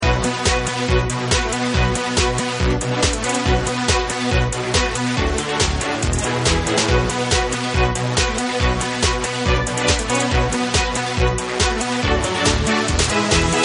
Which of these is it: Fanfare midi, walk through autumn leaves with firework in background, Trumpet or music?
music